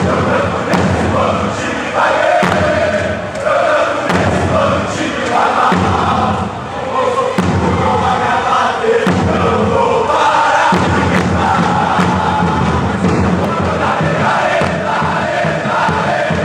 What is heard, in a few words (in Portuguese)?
eu canto nense na vitoria ou na derrota, fanchants